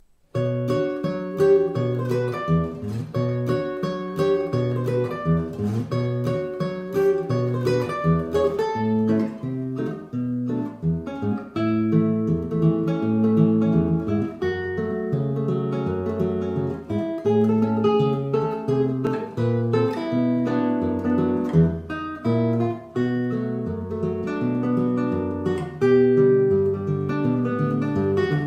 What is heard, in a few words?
guitar part